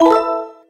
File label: Se Button